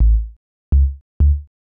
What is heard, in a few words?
HOUSE BASS 125 A